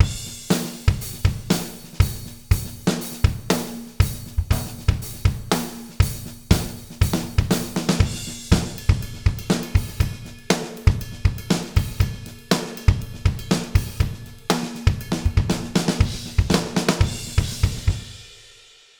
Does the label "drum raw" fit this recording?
Yes